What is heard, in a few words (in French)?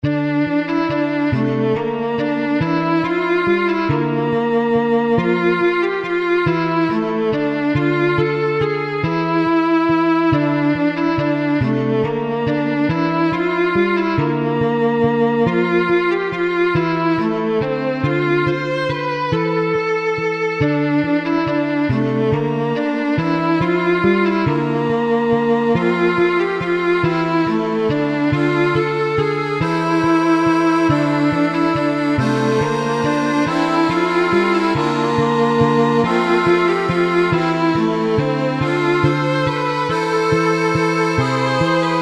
Valse; du chaud pain de campagne